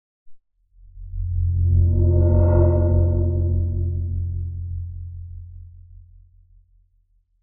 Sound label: Horror bell